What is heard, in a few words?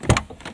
door op; Mafie 1